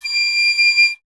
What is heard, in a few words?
FLT PIC D, Piccolo; Miroslav Vitous - Solo Instruments VOL-2